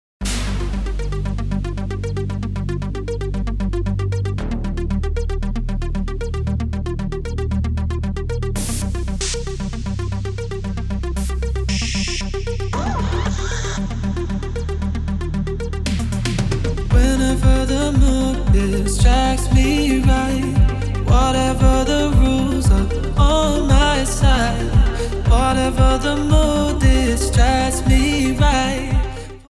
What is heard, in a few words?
Ringtone